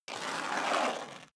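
step high heels concrete grit slide long, footsteps; schritte; menschen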